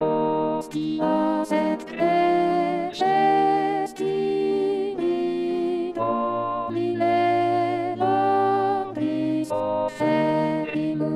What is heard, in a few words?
requiem Hostias soprano; saint-saens; camille saint-saens; requiem 5